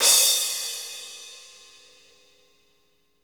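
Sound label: cymbal crash, Real Kit; Real-Kit s12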